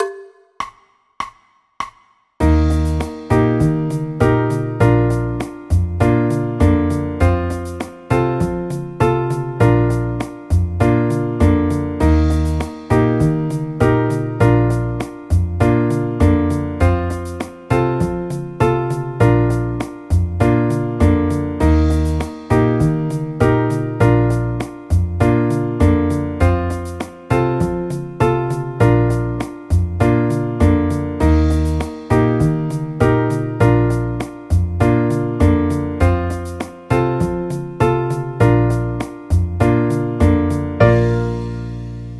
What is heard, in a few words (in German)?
bb dur akkordverbindungen